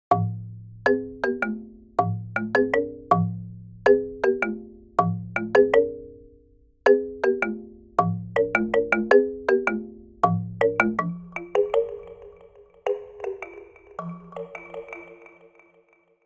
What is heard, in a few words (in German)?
das hoelzerne Pausenzeichen